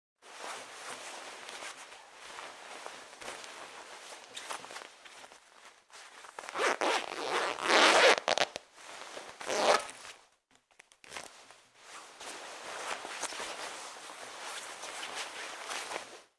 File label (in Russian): zvuk nadev jyleta i zastegivanie; Звуки куртки, штанов и вещей в шкафу на вешалке